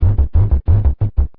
mess, bass

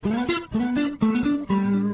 delay, guitars

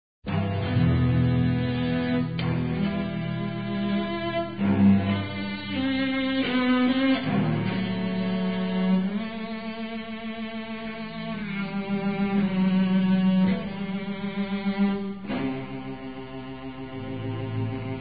cello; Elgar's romantic Cello concerto

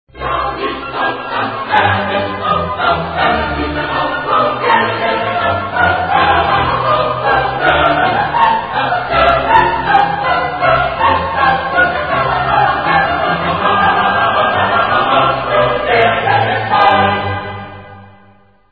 chorus

